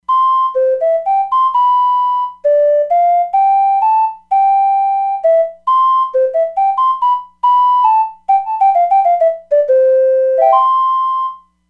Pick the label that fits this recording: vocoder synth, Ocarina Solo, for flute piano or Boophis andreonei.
Ocarina Solo